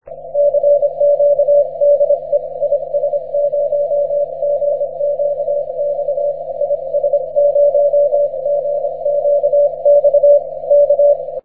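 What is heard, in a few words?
6m continuous wave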